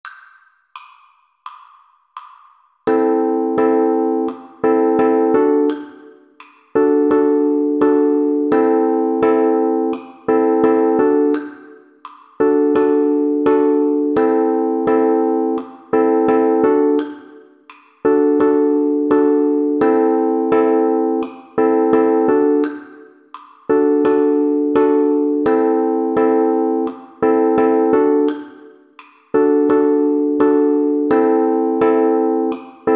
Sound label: Strum, uke